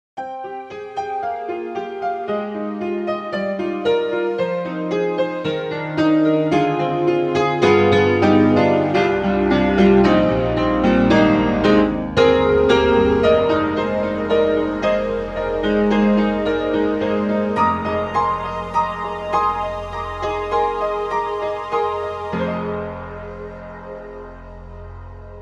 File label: Upright Pianos Modern Upright Modern Play; Synthogy; Ivory II